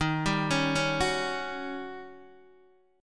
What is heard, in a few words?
Eb 7th Sharp 9th Eb, Guitar Open D; down